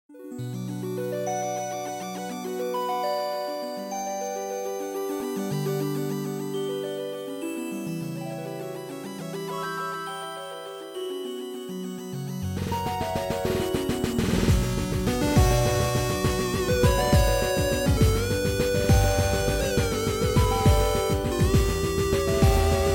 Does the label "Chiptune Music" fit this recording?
Yes